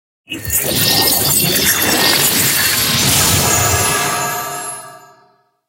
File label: box open; sallybox; nft